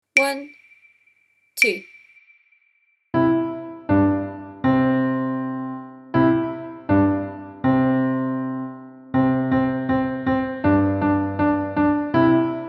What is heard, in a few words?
hot cross buns